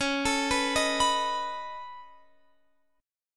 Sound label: Db 7th Suspended 2nd Sharp 5th, Guitar, Standard, chord; Db7sus2#5 arpeggiated; JGuitar